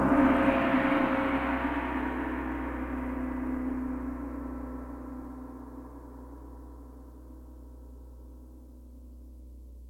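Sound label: gong single open dry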